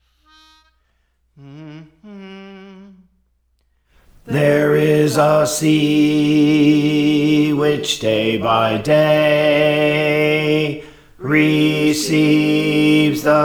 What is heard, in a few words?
Bass; Praise For The Lord Training